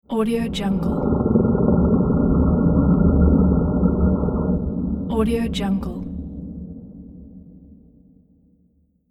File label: it lurks below horror